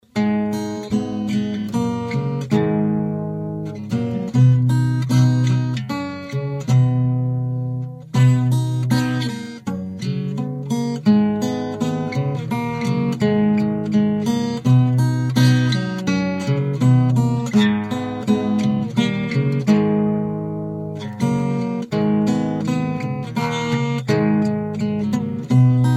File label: guitar